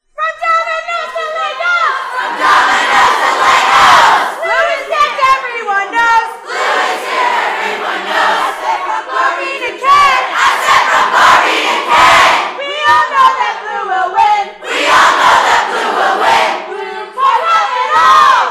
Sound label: Toys Chant